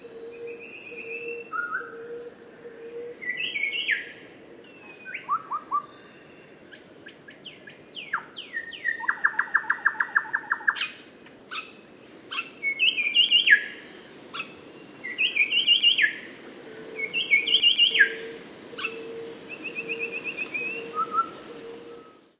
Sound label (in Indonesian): anis bentet kecil